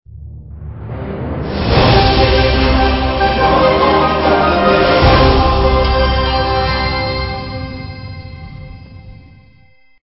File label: Stinger; STINGERS